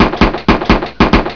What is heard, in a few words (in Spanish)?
tiros